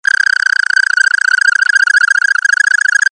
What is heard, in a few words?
cirping